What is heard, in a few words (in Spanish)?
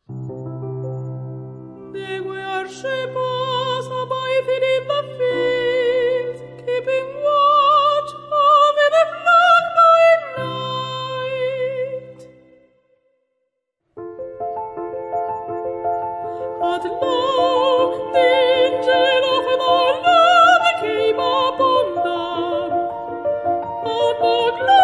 pianista